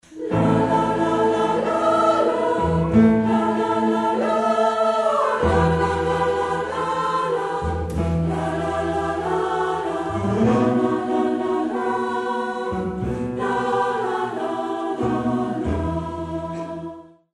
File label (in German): lied tango